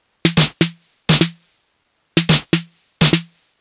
drum switch percussion 125, Classic Chicago House Drum Loops